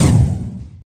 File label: Blast; Teen Titans go! H.I.V.E. 5 Robin Vs See-More